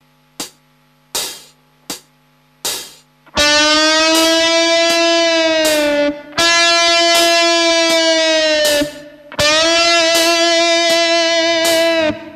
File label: bend, bending